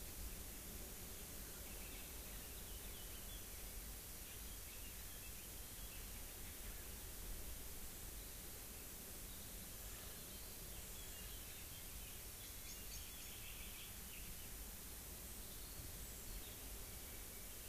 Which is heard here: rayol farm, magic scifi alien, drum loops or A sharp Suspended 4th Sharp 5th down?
rayol farm